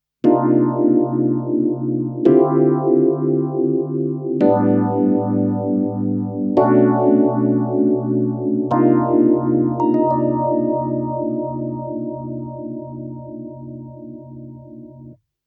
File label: phaser